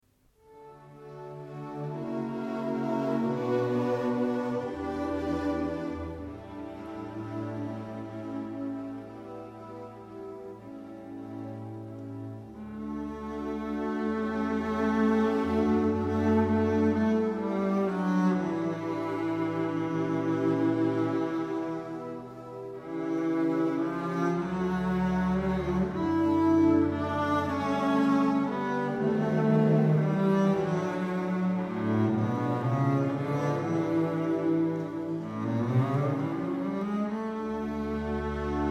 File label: Elegia in Re Maggiore for double bass and string orchestra; Concertos for Double Bass and Orchestra